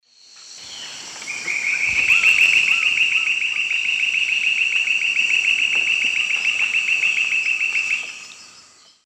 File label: Berlepschia rikeri, song; S. America; Eastern Lowlands